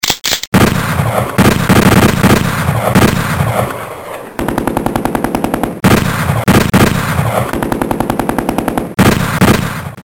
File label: blitz